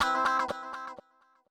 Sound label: ELECGUIT, HOUSE, 125, A; eJay; Guitar